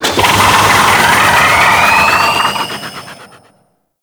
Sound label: Reverse, Vehicles; Warframe; Archwing Demolition